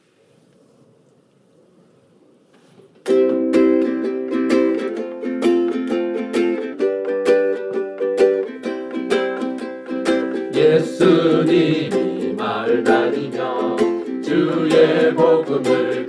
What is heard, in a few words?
Korean Song; Yesunimkkeseo Maeng in eul Gochisida; Jesus and Bartimaeus